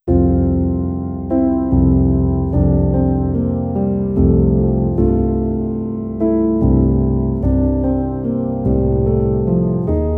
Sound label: Raindrops, PIANO